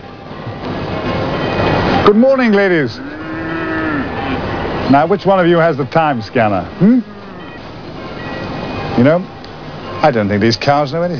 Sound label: Cows